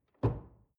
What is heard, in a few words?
drawer large trash close door